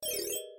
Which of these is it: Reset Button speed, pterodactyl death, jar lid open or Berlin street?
Reset Button speed